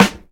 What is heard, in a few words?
dark sounding acoustic snare sample g key, snare drum tuned to the G note